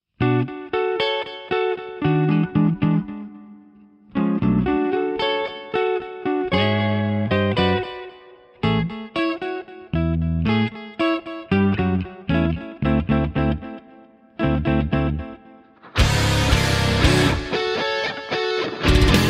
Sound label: metal; mii